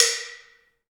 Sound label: AMB SLIT, LOG DRUMS; Heart of Africa